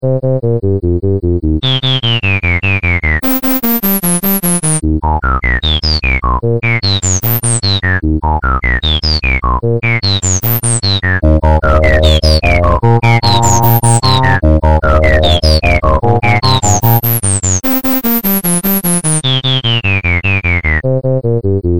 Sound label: Annoying Techno Music